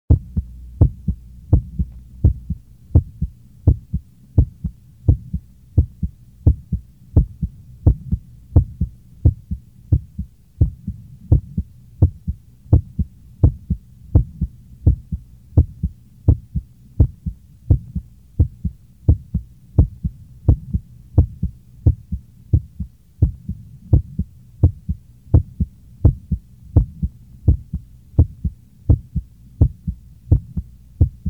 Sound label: Heartbeats; SOUND EFFECTS1